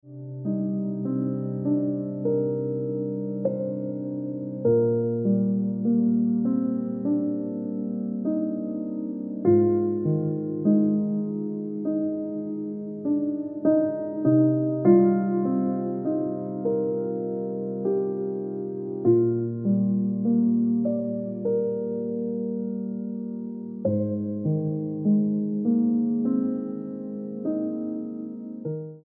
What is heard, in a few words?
Ringtone Piano Music